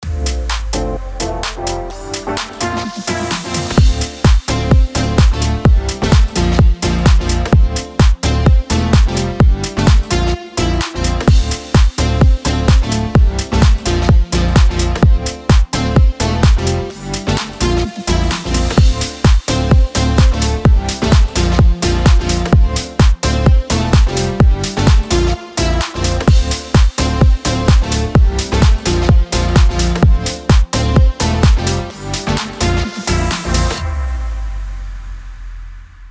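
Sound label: HOUSE D minor 128BPM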